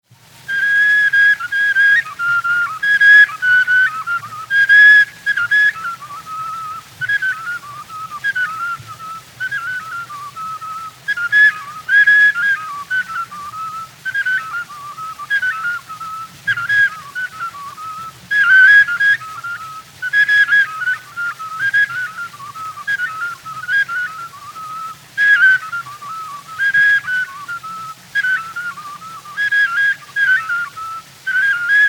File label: flute recording, Awka; Northcote Thomas; 1910